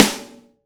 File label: TY Rim, Roland BK-5, Snares